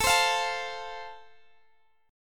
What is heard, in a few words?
A Minor Sharp 5th, Guitar, Drop D